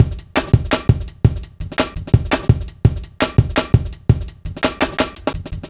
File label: Live Wire, Drum Loops, 168.5, Jungle